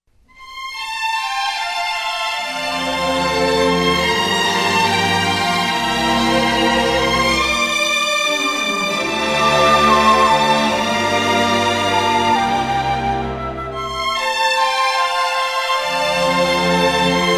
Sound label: Strings Orchestra